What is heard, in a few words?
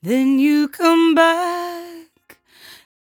57bpm Am, Velvet Vocal; Zero-G